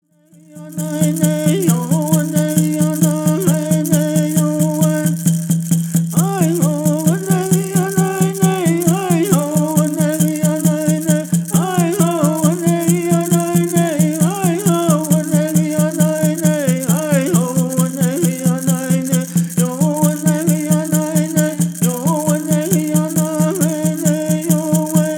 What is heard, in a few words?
Song